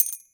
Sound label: Coin Bag Ring Gemstone Item